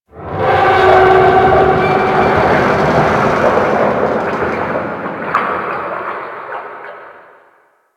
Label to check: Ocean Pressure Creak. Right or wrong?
Right